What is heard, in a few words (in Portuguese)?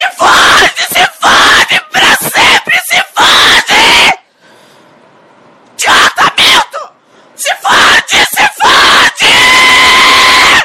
toque